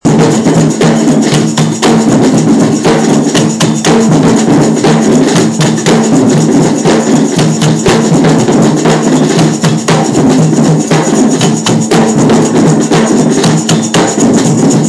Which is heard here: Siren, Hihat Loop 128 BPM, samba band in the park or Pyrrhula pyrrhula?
samba band in the park